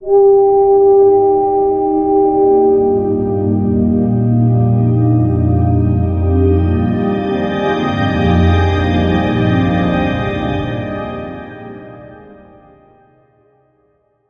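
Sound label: majestic underscore flourish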